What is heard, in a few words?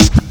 SD, dance drums hip